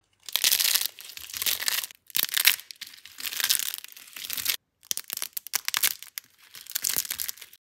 chalk crunch; له کردن صابون گچی; اسمر

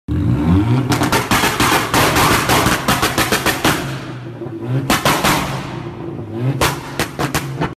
Golf Exhaust

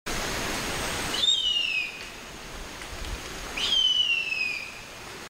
Harpy eagle; عقاب هارپی

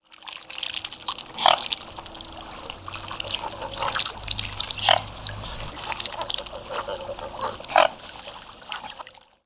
Mute swan; قوی گنگ

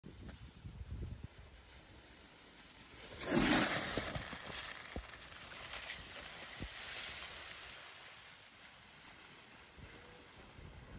Snow skiing; اسکی رو برف

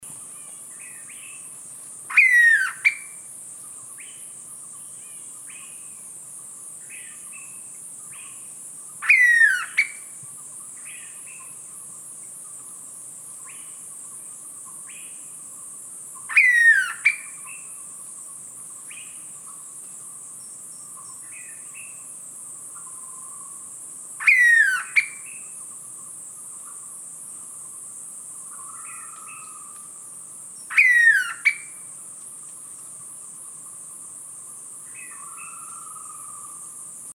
blue pitta, پرنده پیتای کبود

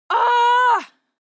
"ah", woman

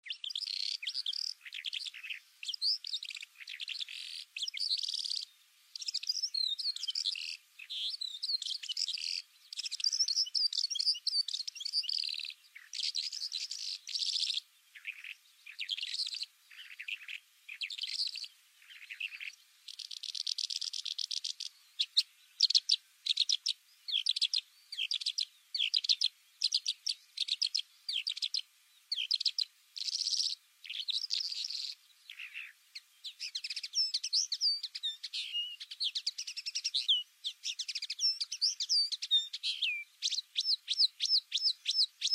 horned sound bimaculated lark

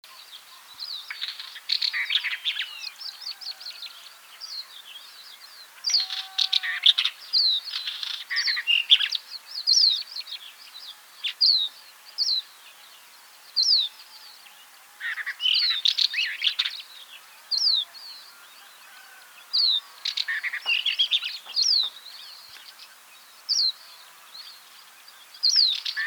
Capped wheatear

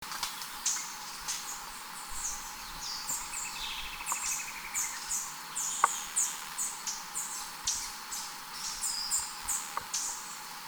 yellow backed tanager

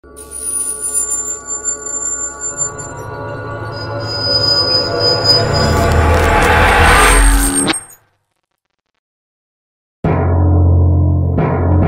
Timelapse; گذر زمان

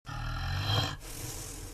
Mustelidae

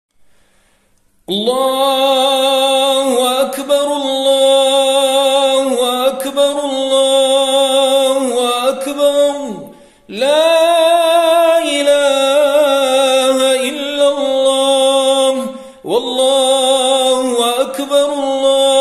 takbir, تکبیر عید فطر

